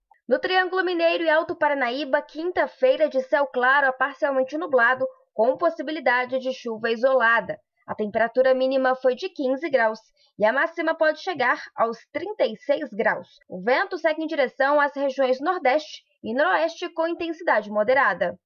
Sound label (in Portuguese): triangulo e alto